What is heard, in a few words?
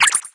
Bat atk; 攻击音效 atk 蝙蝠攻击音效; 莫提斯; 荒野乱斗